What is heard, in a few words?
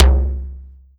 Yamaha TX81Z Acoustic Tom